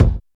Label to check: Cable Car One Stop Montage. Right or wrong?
Wrong